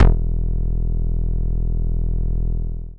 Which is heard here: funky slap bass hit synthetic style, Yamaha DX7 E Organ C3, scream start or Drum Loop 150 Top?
funky slap bass hit synthetic style